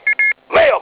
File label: mail clean notification sound